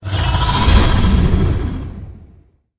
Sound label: ghost blader attack